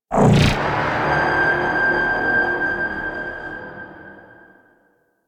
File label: Space Fighter Destroy; Warframe; Enemy Ships; Space Fighter Sentient Coil Agent